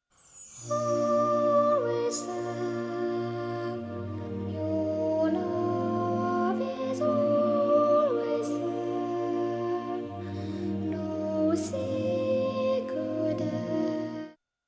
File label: leap